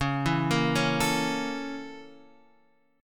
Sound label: Bb Minor Major 7th Flat 5th